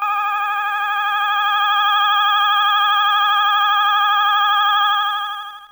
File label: electric song; The Music Studio